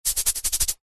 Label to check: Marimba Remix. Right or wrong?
Wrong